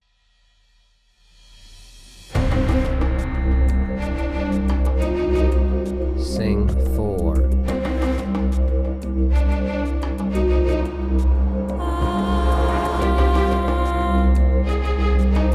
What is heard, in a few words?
Alto Key of C; movie score ear training